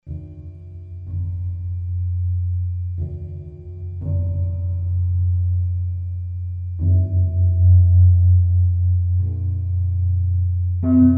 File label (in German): Pentaton